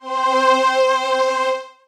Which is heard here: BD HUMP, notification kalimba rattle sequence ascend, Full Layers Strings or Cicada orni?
Full Layers Strings